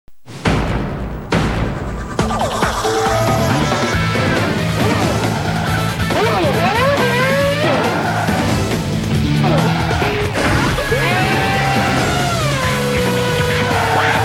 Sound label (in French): generique formule1; sbs6